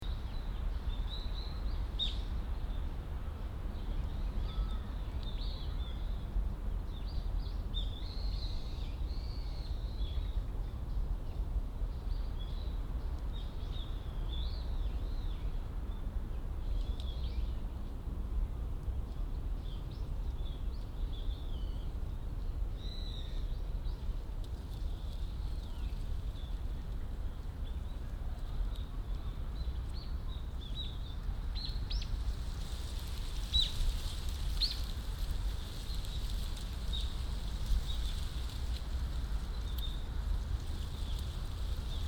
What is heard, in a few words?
wind